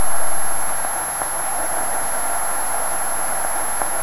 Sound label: glitched tones Interference